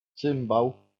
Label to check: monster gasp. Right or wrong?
Wrong